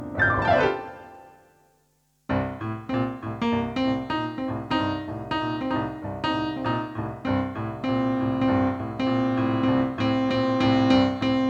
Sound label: Pianoversion